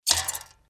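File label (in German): bleigießen single sound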